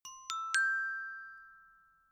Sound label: notification glockenspiel triad up major